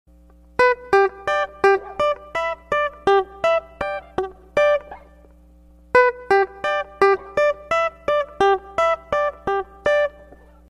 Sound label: iphone marimba slow ringtone